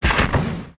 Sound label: BOULDER BREAK; DTL